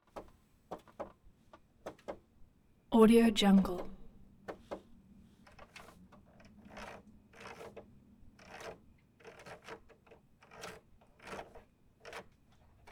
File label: 2005 dodge ram; prosoundfx